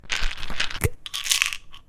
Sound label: Painkillers Equip